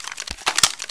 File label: elite reload start; czero opforce